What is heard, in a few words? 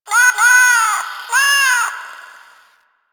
Cat Loot Crate Meow; Nineteeen Ninety Nine; Cat Loot Crate Common